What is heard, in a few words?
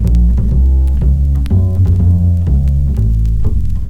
Acoustic Bass, Loops; ACID; Basses